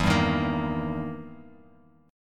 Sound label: E Minor Major 7th Flat 5th, Guitar, Open F; strummed; JGuitar